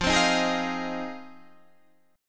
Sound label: G sharp 6th F, chord